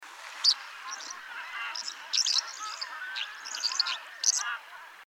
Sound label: lark; mnglark; mng telmen nuur